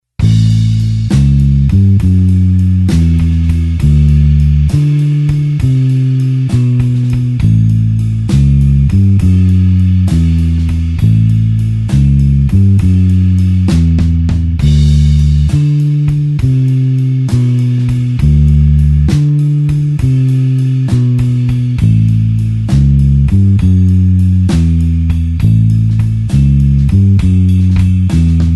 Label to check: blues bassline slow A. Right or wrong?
Right